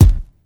rich mid range bass drum c key

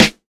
sparkly snare d key; snare drum samples

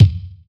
steel kick drum sound f sharp key; kick drum samples

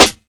crunchy snare sample c key

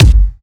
bright kick drum one shot g key

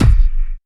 reverb bass drum sample g key

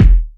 natural bass drum single hit f key; kick drum samples

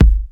natural hip hop kick sound g sharp key; modern kick drum

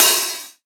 short reverb open hat one shot c key

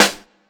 crispy snare single hit g key

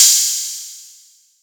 roomy ride cymbal sound clip a sharp key

